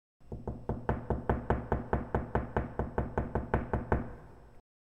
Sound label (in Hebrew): נקישות בדלת